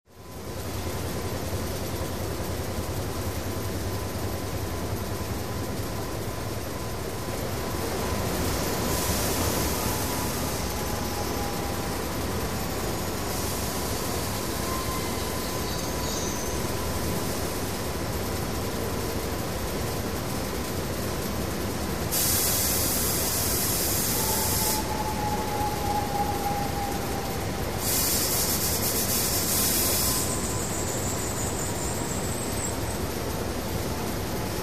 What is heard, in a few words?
Steady Rattling Conv, conveyor belt